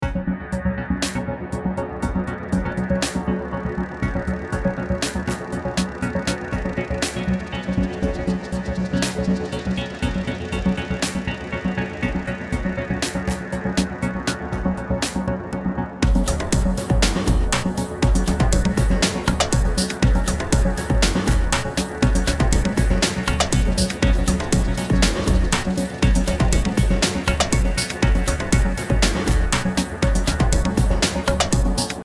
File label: loop dramatic